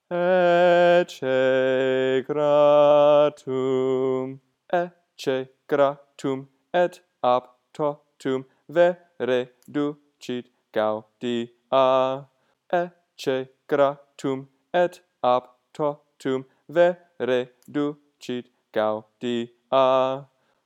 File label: ecce Gratum Mens Part Sung; latin